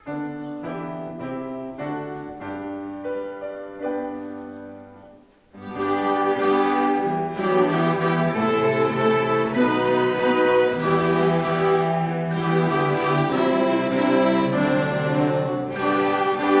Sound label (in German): Kinderorchester